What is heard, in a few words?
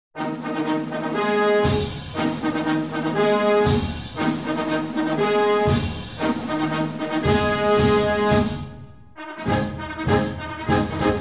four ruffles and flourishes flag officers march